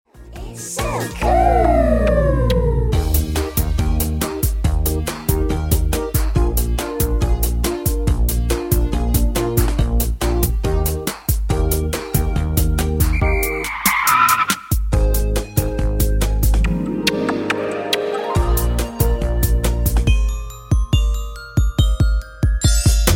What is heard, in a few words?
Karaoke